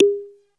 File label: THUMBED KEYS; Music Store Sound Service; Einstein